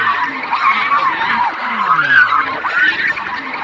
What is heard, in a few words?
calls, sci-fi atmos; Zero-G Ambient 1